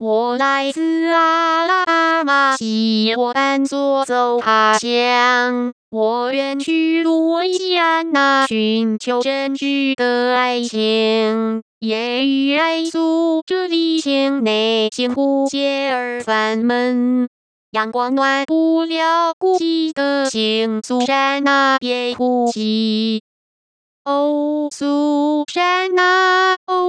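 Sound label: synthetic singing voice, 女聲, smoothed; 我來自阿拉巴馬, 攜我班左走他鄉; 我遠路易西安那, 尋求真摯的愛情; 使用頻譜包絡內插之國語歌聲合成 (Mandarin Singing Voice Synthesis Using Spectral Envelope Interpolation)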